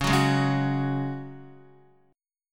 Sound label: Db Suspended 4th Db, Guitar; strummed